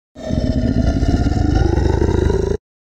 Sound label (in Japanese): 大きな猫さんの鳴き声